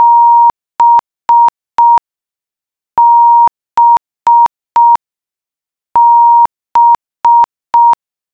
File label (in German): Fehler